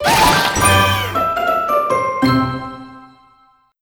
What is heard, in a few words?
Crunchy Finish